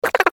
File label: creature cackle funny